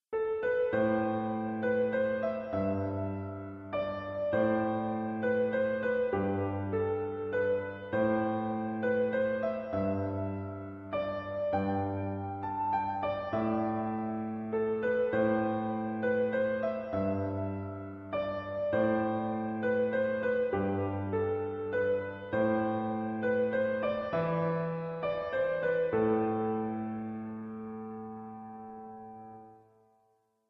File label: melody